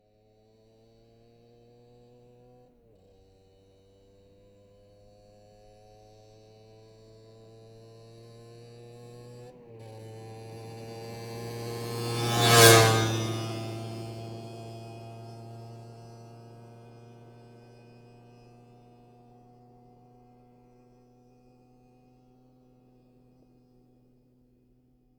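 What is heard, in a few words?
Keeway Exterior Pass By, Moped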